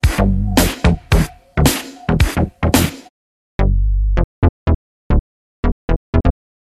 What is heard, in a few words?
Diva boogie bass; Minimoog